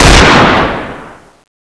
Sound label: sl8 shoot